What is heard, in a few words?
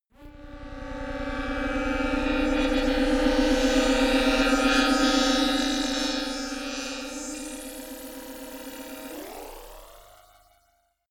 whoosh drone swell detuned long